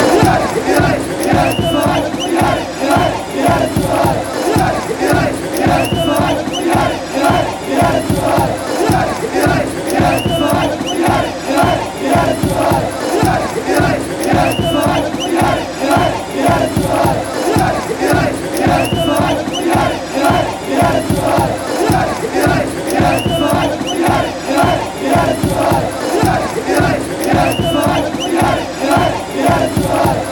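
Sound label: y dale y dale, football chant; pachuca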